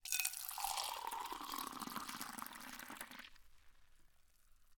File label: tea chai kitchen fully prepared pouring mug to pot liquid fill up aerate; wakey wakey; Sonniss GDC